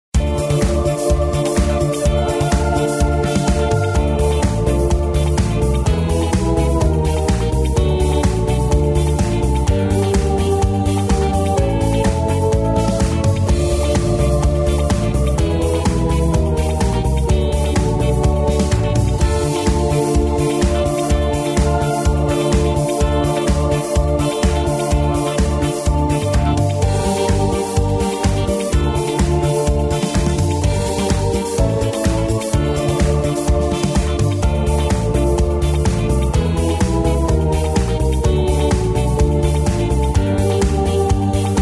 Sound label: creepy hoedown rhythm track; square dance music